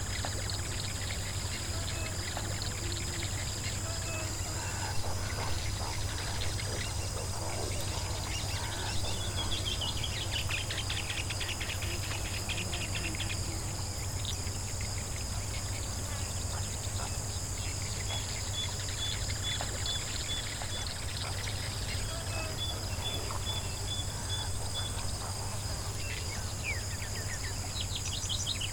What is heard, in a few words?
ambient red savannah; Angry Birds